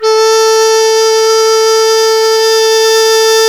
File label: QU DI A4, QI-DI FLUTE; Spectrasonics; Heart of Asia